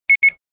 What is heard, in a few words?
Electronic military device beep; Military Equipment